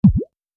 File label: key bloop; mintty